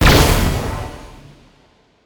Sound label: impact; smite; chiron